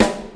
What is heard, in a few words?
studio snare drum c sharp key